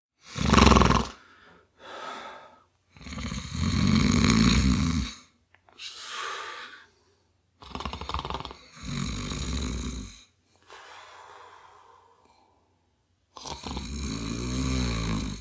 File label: Snore Long, Male snore, long; Snoring Sound Effects; human voice; Snoring (Sound Effects:Human); VoiceBox; Twistedtracks